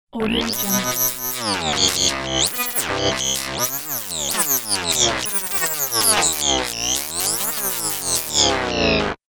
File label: square waves noise sweeps; fxprosound